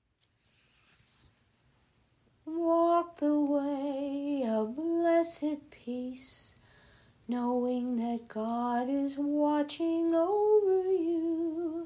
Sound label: acappella